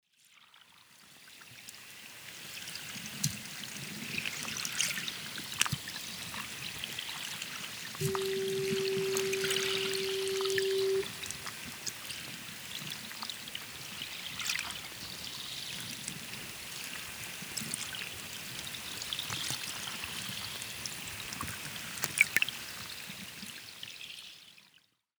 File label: underwater - waves on beach